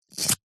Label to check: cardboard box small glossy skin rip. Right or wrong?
Right